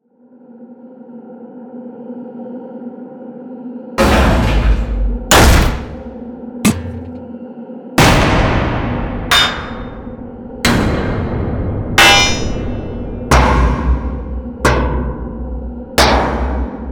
Metal Impact Sound Effects; Bluezone

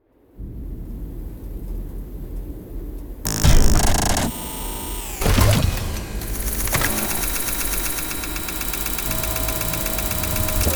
Prototype Military Robot Sound Effects; Skynet; Bluezone

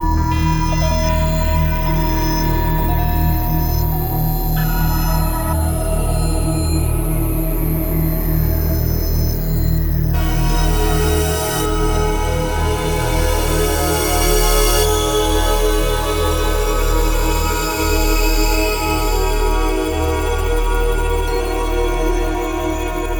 Mixed Ambient Pads and Soundscapes; Pandora; Bluezone

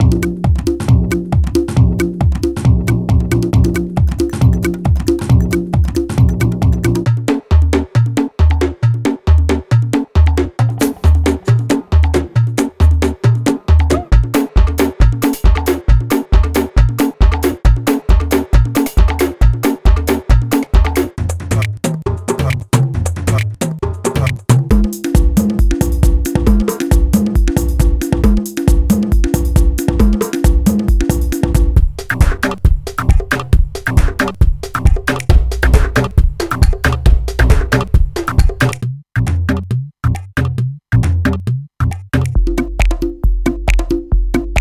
Tech Tribal House Drum Loops; Bluezone

